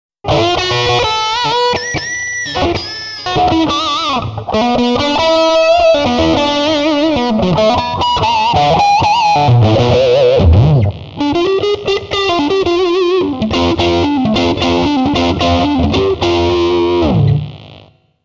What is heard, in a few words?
Nomad lead